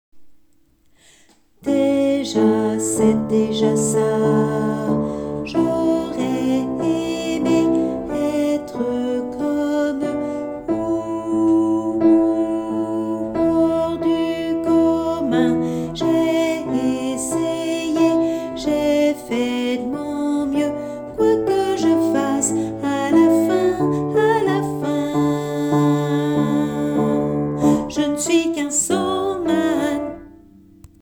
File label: alto